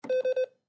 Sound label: Printer Copy Machine Push Button Beep; Printer Copy Machine Push Button Error Beep; Printer Hewlett Packard Copy Machine Push Button Error Beep; Office; Blastwave